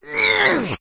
cartoon small character high pitched voice fight vocalisation